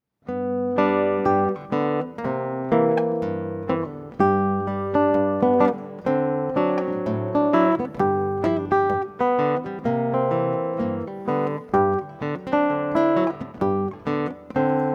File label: Cold Water Blues